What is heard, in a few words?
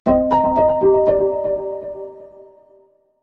jingle; ジングル[シンセ系](5); シンセディレイ系